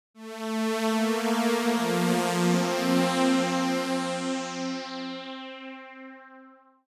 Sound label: Bright Strings; opxpro2; Riddle